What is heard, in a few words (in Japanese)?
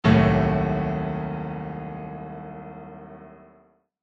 ガーン; 場面切り替えや心理描写; 演出効果音